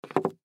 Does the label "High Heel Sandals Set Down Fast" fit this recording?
Yes